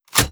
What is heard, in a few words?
Periscope Button Up; moul-assets